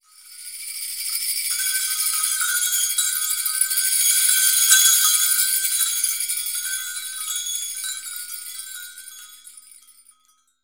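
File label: amb bells, ambient percs; Univers Sons; Basicussions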